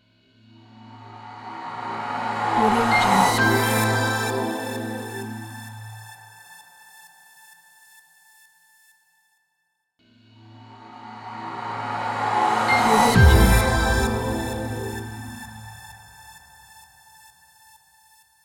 ambient logo

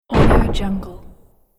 world builder wood hit

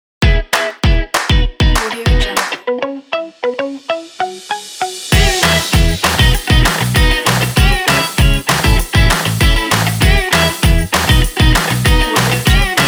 upbeat happy kids indie rock

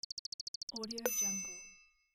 counter

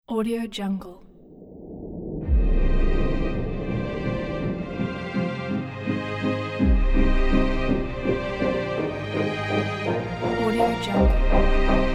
dramatic epic sport

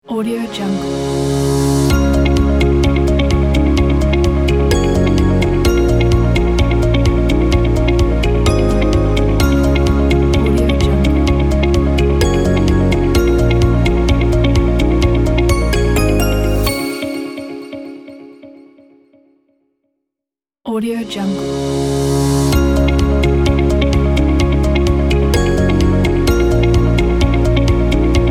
logo corporate

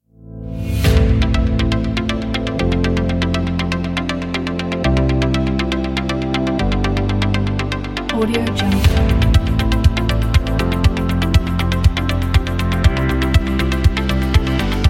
ambient technology inspirational motivational

